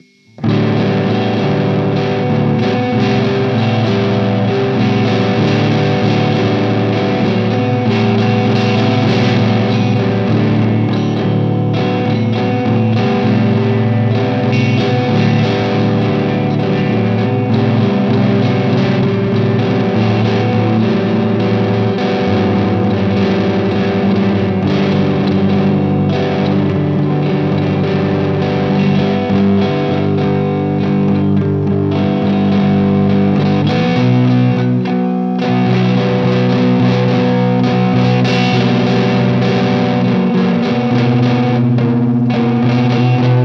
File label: Messy Droning; Hylics